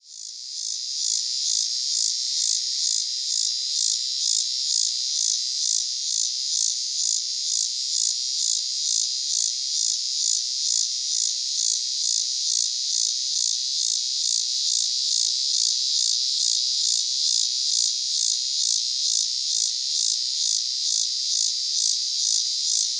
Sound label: Neotibicen pronotalis pronotalis, cicadas songs; US; SD; East of the 100th Meridian; filtered